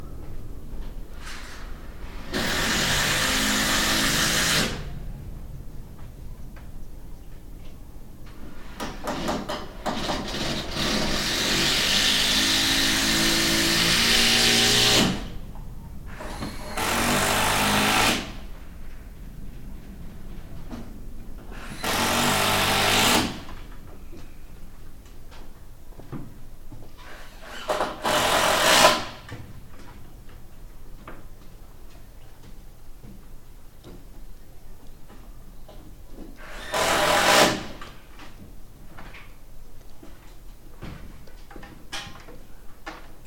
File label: building home